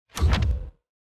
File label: environment successful build low